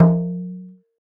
Perc Tuned High, One Shots, Drums, Misc Percussion